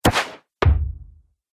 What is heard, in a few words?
Archery Crossbow Hits Target; Bow And Arrow Sound Effects